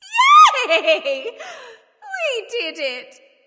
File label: yay; smite; nox